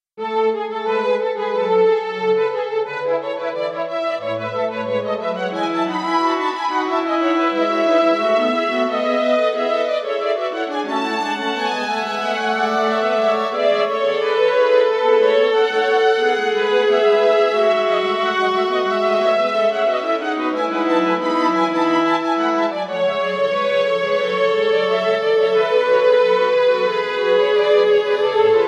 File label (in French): cordes; bach johann sebastian; 15 inventions a 3 voix